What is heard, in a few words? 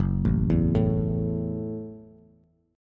Gb Minor 7th Gb down Bass; arpeggiated; JGuitar